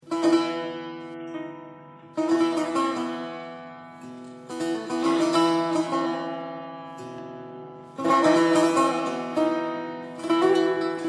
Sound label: setar